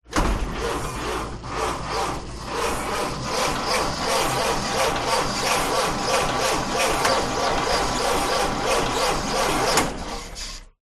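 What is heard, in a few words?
Model T Ignition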